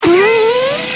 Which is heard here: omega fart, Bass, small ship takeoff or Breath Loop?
omega fart